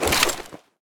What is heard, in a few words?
shotgun draw; weapons; kuilu